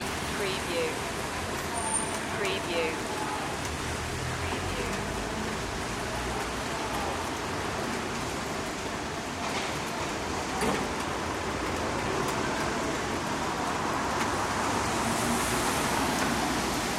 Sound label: rainy city ambience